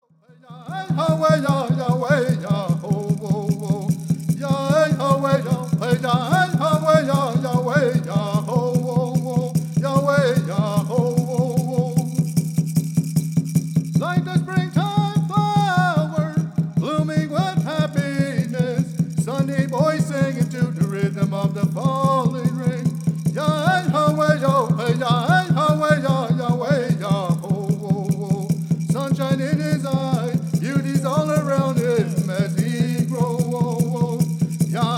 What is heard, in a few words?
Peyote Song